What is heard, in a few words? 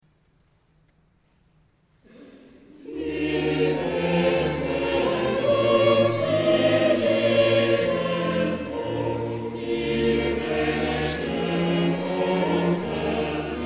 Choral; Christ lag in Todes Banden